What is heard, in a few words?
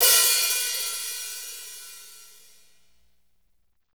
hat, Power Ballad